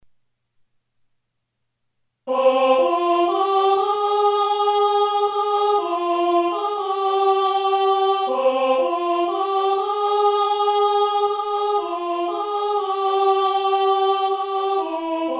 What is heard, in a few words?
alto, choral music